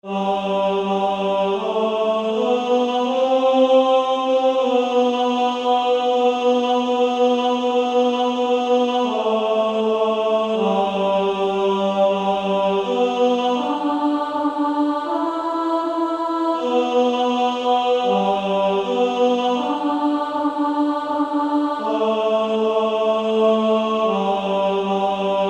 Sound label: Female Choir; 2nd Alto